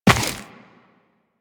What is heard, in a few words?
Run Dirt, Footsteps; Warframe; Tenno